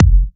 Soft Kick; Vengeance Samples

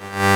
Synth Shot; Vengeance Samples

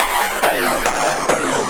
Fill 140 BPM

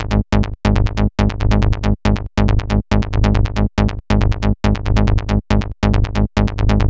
Bassline DRY 139BPM, G# minor; Vengeance Samples; VTS1 Brandmark Kit

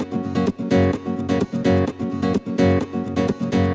Nylon Guitar F# maj, 128BPM; Vengeance Samples; VEH3 Nylon Guitar Kit 2

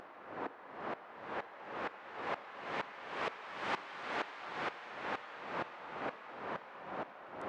128BPM FX; Vengeance Samples; Guess What Kit

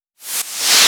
FX Reverse, 128 BPM; Vengeance Samples; Vocal-Hook Kits; Song Kit; Freaky Boys

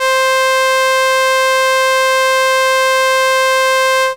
Violin C5, ARP Solina, Unmodulated; musicradar-synth-samples

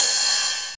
Ride, Cymbals; Vengeance Samples

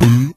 Vocal Oneshots; Vengeance Samples; Vocals

